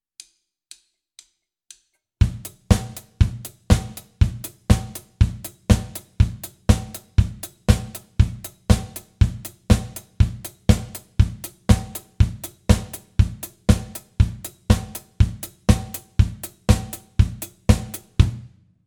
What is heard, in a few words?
basic disco house feel